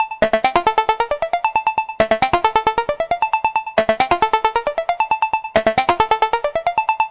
pingy sharp arpeggiator, synth and electronic loops